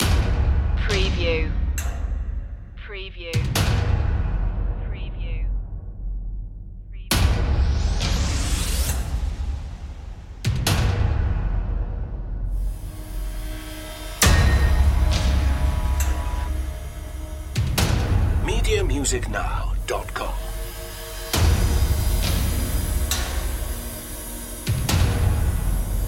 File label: under fire loop